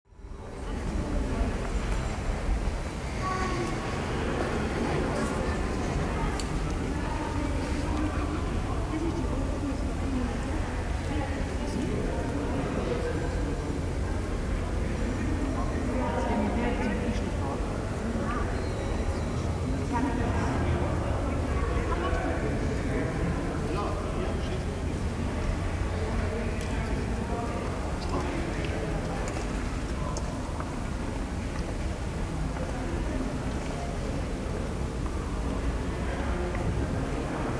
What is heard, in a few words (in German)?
Rueckzugsort, courtyard; Soundwalk; The relaxed atmosphere arises particularly from the strong contrast to the bustling Old Town, making the courtyard perceived as a retreat.; A Soundwalk for Klangspuren Festival 2025